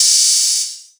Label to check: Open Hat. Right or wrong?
Right